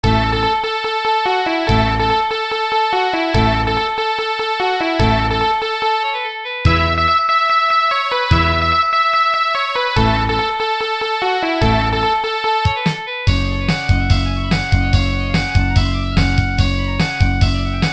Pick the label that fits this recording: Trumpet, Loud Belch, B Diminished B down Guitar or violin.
violin